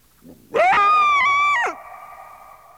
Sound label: Coyote